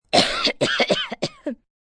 Cough Dirty, Cough, 1 child, several, sick, chest cough, human voice, kid